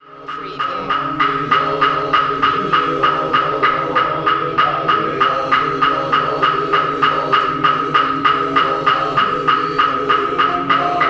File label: monk Buddhist ceremony chant male drone sticks Kyoto Japan